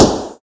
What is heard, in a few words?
gunshot; Weapon-Explosion